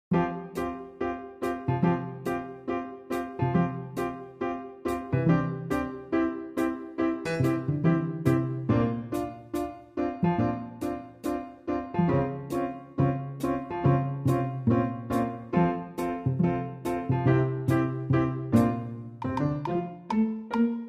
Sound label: naughty backing